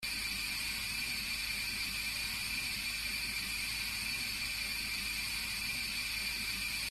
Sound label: ambience track gas; dragon fin soup